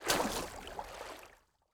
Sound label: Personnel Footsteps Water; DCS